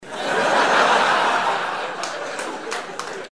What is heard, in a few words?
laugh applause